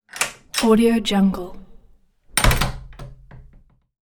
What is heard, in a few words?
farm door latch